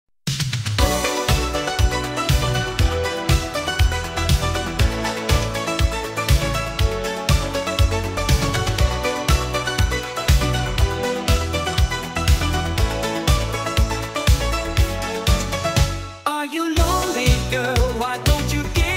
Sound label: ai italo disco; turko italo